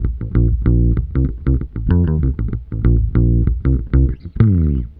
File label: BASS, BASS GTR